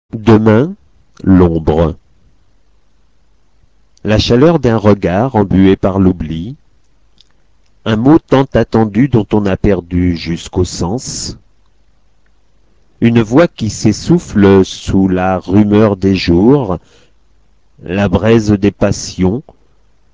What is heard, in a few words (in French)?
son musique